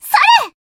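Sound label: attack, Japanese; suzette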